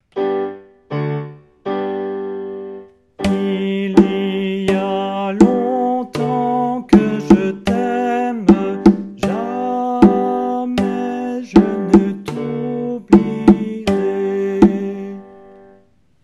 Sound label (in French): Fontaine Sop